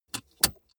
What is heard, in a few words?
car bmw e46 interior safety belt insert